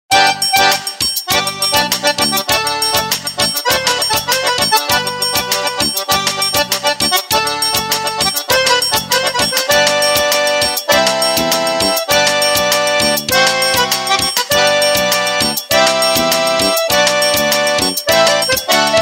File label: Baiao